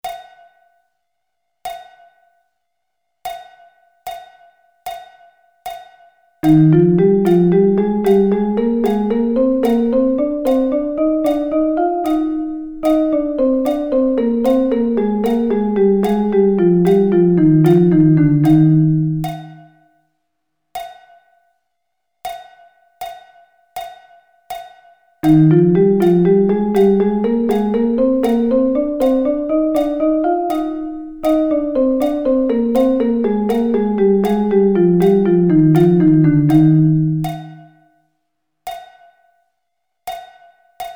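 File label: E flat Double Sticking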